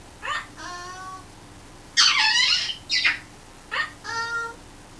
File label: uh oh